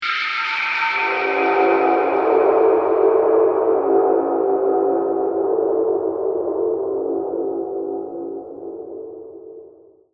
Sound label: music mystery; AquaNox 2: Revelation